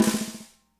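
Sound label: medium snare, natural kit; Spectrasonics; Burning Grooves CD2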